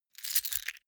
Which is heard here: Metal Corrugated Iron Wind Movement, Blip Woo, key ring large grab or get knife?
key ring large grab